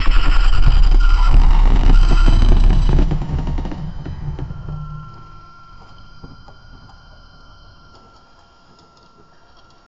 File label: two heavy metal robots